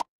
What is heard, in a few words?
ui pop